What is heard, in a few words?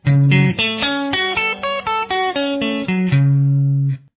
Arpeggio Cm style, guitar